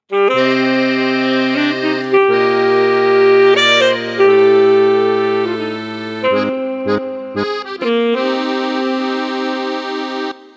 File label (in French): duo saxophone et accordeon; loto sonore